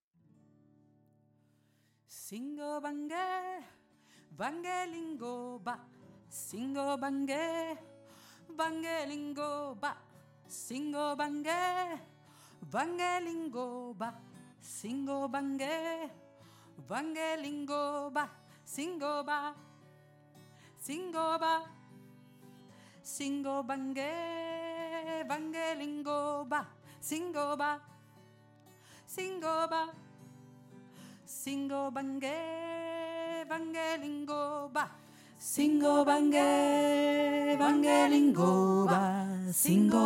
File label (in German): singobange tiefe stimme